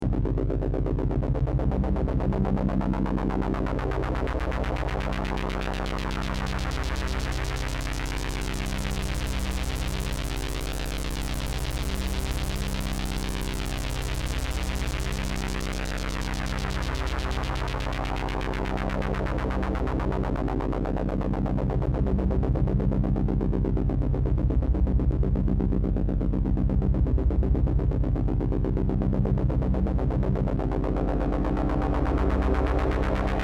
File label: no res adsr lfo BP; state var vcf; analog synth